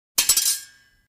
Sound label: Two swords clash against each other